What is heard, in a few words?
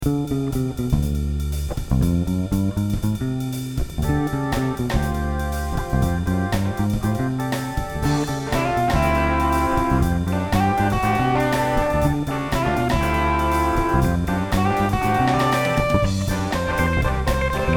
Bassa loop mix